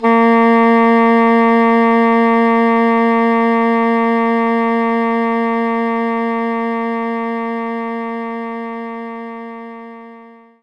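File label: English Horn A#3; knights try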